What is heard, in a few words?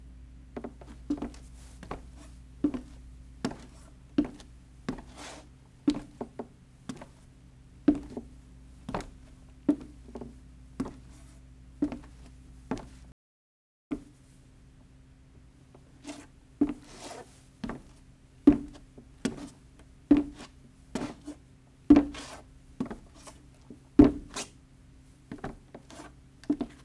Walking Footsteps On Wood